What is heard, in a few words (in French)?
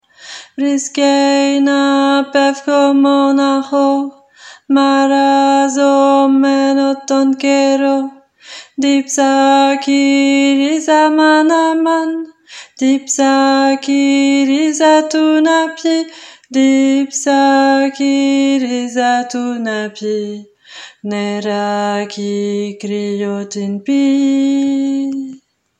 basse